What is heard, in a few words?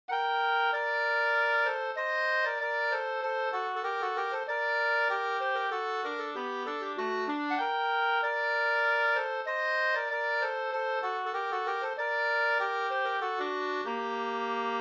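polska, two-part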